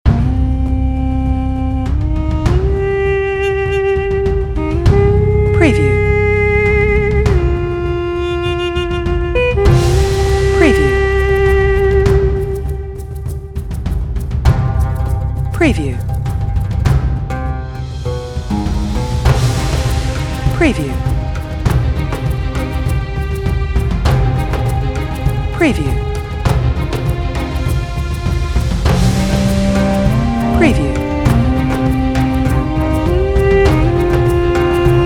Lands of Middle East - Ethnic Arabic Islamic World Music